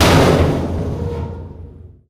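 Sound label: panels impact large, metal; physics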